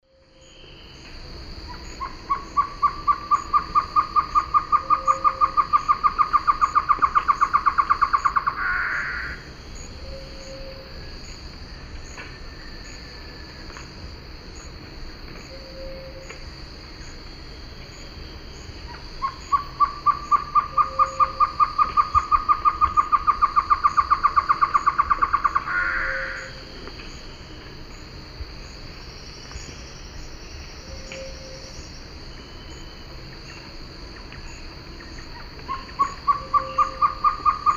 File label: Taraba major, song; S. America; Eastern Lowlands